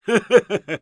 laugh evil; Risa malvada 5; Engineer

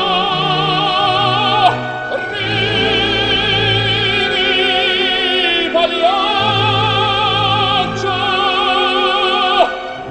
Sad Violin

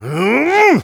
Spy taunt head exert; «Хыыыы эх!»

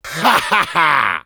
laugh short, fr; Rire court 2

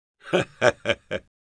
Engineer laugh short, es

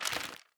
Taunt medic xray crumple

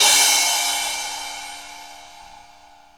Hitsound percussion

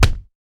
Taunt yeti chest hit

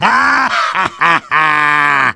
Demoman laugh evil

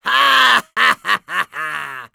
Demoman laugh evil, es; Risa malvada 3

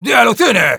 Sniper cheers, es; "¡De alucine!"

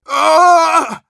Engineer pain severe, ru

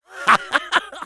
laugh short, fr

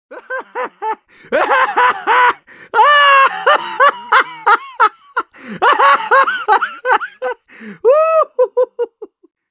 laugh long, ru

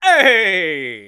motorcycle; Scout

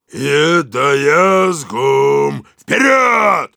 singing ru; Heavy

